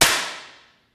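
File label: physics clipboard impact large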